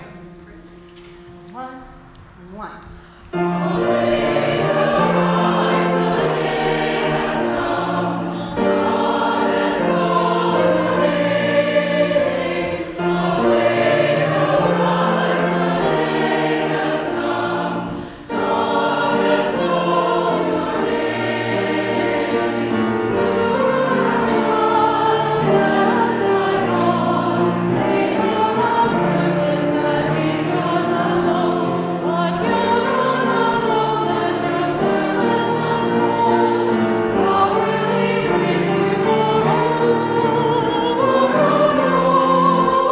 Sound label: Awake Arise satb